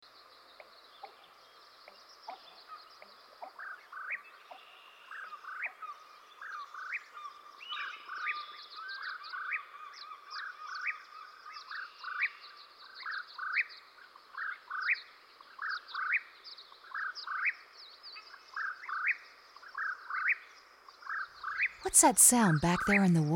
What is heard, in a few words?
whip-poor-will